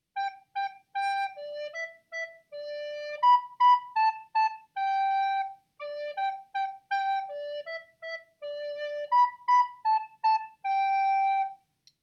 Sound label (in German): Sopranflöte; Klangproben Instrumente